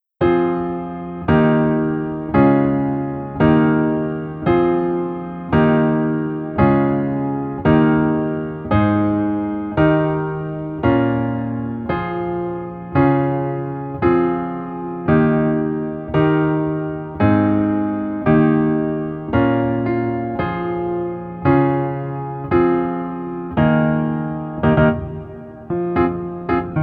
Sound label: Piano ver